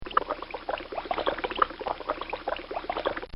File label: Cartoon Sound Effects Bubbles